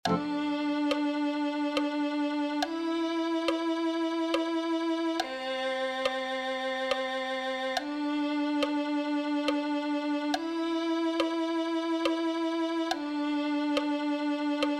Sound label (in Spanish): clarinete